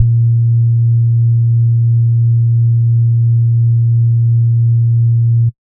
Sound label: SBASS A#1, Sub Bass Sine; neuro; Stanza